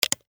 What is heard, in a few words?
dice click; Dice World